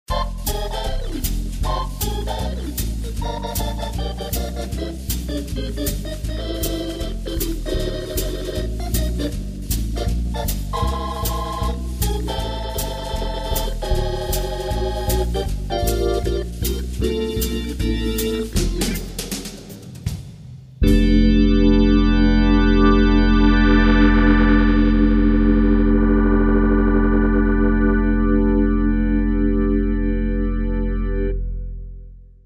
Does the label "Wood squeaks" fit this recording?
No